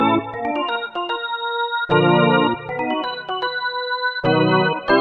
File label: baseball charge